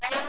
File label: BUG; 昆虫